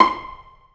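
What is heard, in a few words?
solo pizzicato, Strings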